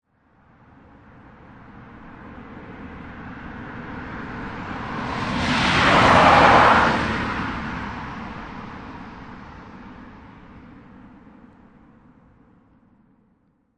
Transportation Automobiles, Car By And Away, Dry Road, 45mph